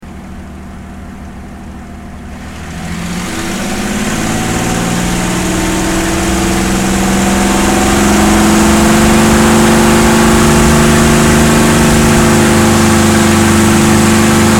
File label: mustang takeoff interior